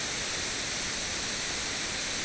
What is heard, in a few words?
TV Static